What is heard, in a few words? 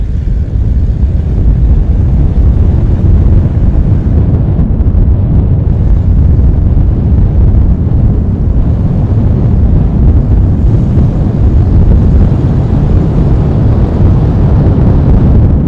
Wind Noise Senn 416 with no popper